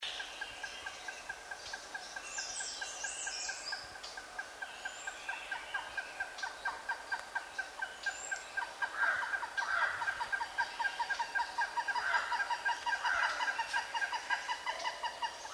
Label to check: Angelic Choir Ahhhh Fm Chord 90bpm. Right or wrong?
Wrong